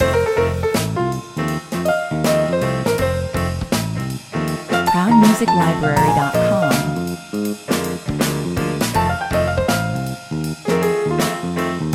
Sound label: trio jam 164 bpm